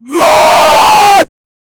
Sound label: warrior battle cry sounds; human emotion